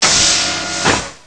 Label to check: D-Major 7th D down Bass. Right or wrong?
Wrong